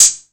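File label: 808 open hi hat; Wizoo - Platinum 24 Electronic; 808 DRIVE P1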